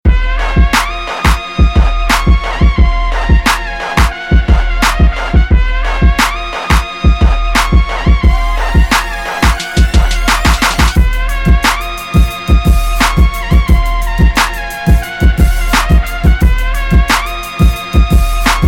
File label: French Only